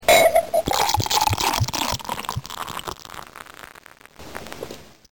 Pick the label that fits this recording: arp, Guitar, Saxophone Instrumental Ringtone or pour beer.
pour beer